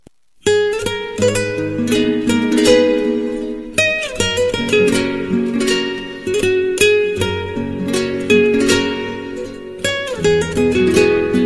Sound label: Romantic Guitar Tone